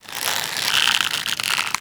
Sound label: Nunchaku Finisher Tension; Warframe; Tenno